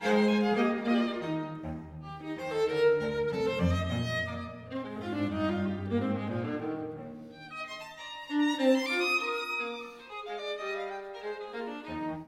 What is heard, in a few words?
cadence 2 extension